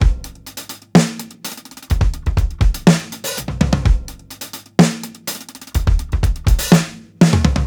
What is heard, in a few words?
125 MORE ROLLS, DRUMS LOOPS; BASEMENT DRUMS VOL 2